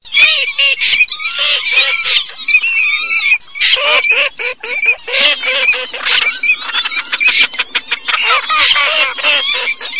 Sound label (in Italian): beccaccino pavoncella alzavola